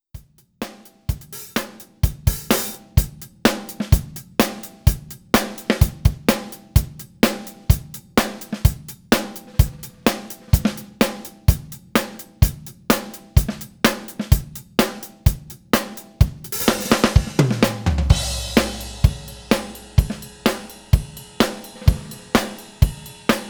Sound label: standard rhythm